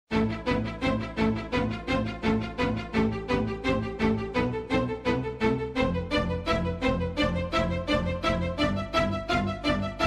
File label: hip hop beat